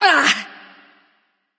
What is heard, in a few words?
grunt attack; smite; pele